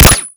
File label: fire lmg silenced; m240; Modern Warfare